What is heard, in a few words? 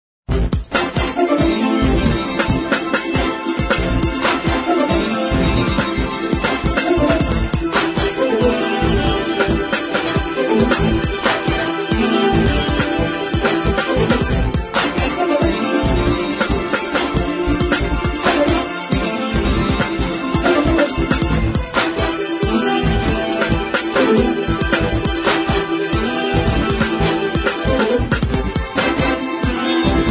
DISCO ORCHESTRA; Suite Collection Vol.5